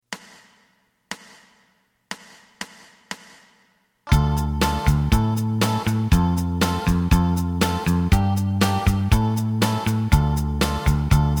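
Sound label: lead guitar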